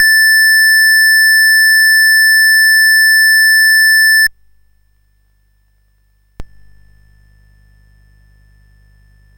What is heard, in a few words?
Dragonworld sid CSG8580R5